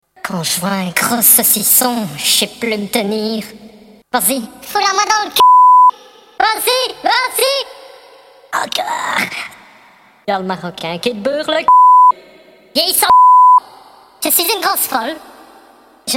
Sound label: bassline